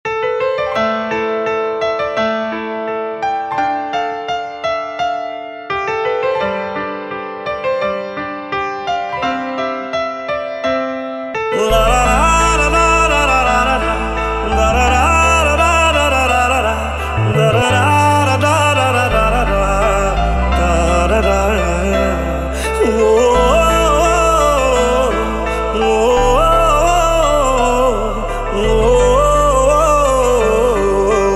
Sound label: Instrumental and Humming Ringtone